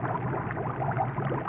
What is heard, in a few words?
Water, Bubbles, Cauldron, High; Poetry Project